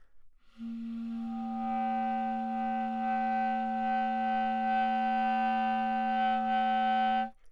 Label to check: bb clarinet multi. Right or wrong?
Right